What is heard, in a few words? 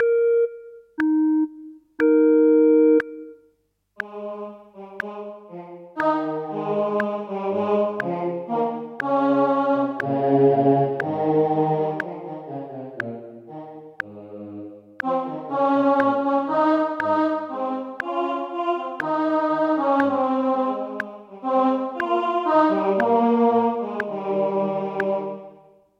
O clap your hands; Gibbons